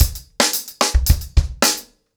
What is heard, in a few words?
110BPM, ACOUSTIC DRUMS